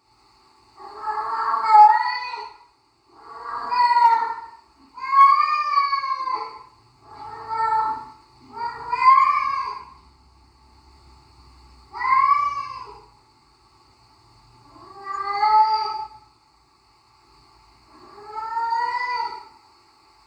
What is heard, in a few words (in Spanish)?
Cat sexual, sonidos del gato